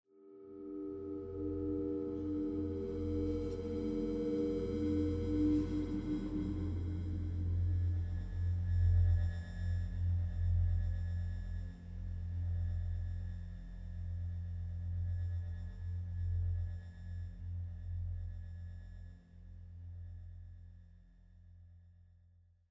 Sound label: Dark Scary Explore Mood; The Last of Us